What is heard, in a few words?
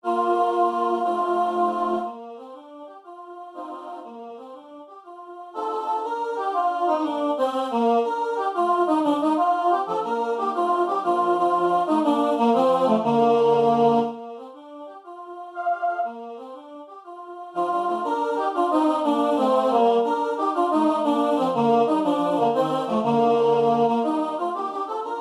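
soprano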